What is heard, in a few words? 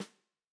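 Tam Snare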